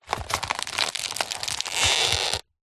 impacts egg box egg shells squeeze crack

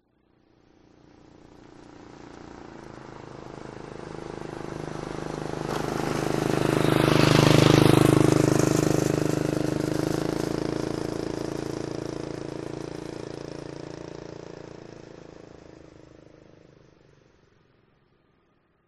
scooter passing; the sound pack tree

